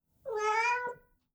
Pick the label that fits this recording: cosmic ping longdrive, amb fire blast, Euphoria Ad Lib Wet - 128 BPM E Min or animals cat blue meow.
animals cat blue meow